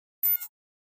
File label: Flash Beep Elect Tone